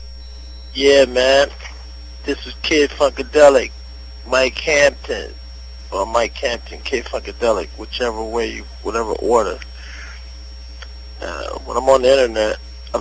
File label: talkbox